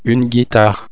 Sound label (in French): guitare